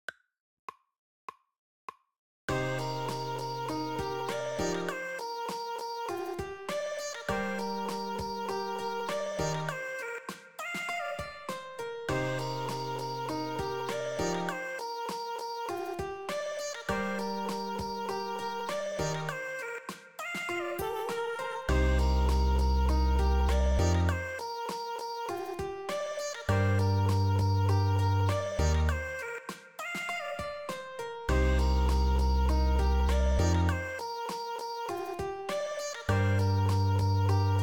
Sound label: basement Jam drumless